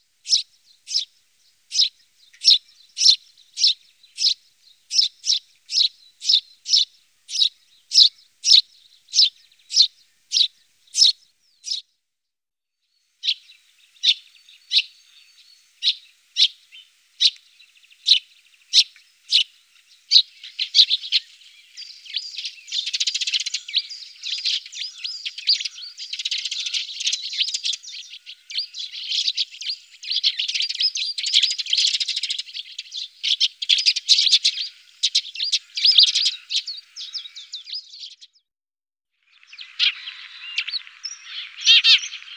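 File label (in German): Feldsperling, tree sparrow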